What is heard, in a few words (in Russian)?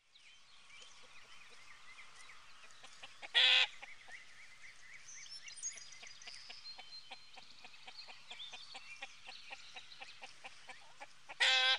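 природа птицы кудахтанье курицы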